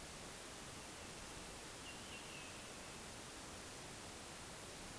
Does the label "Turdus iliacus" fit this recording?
Yes